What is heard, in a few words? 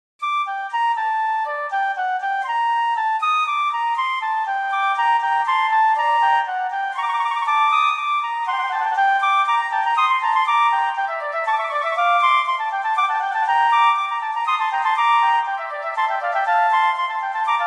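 Vivace moderato, violin viola; Telemann; Canonic; Sonata 4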